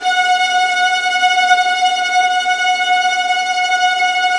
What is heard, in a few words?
VIOLINT G#, Synclavier Strings; ILIO